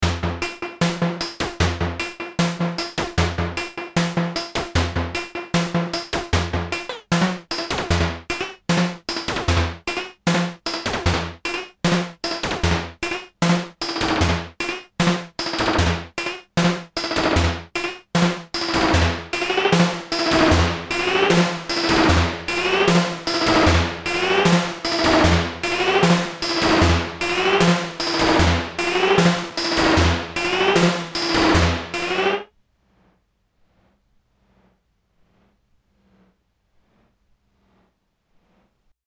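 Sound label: Moog CV Mod Speed Feedback; Echo Fix EF-X3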